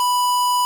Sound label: pico B4